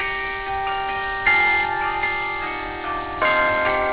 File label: enschede, carillons